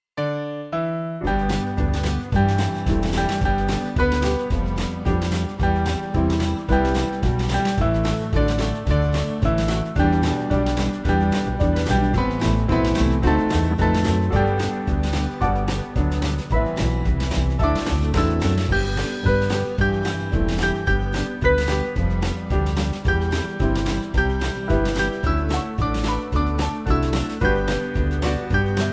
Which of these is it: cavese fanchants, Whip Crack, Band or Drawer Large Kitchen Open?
Band